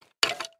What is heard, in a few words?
footstep; The Mercenary Chronicles